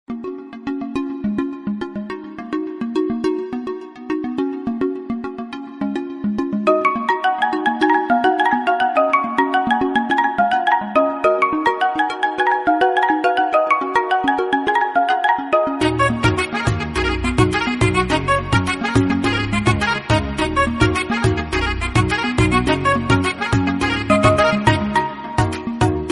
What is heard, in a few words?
english instrumental song tone ringtone